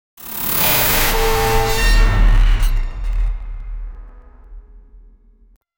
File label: short cinematic, bass hit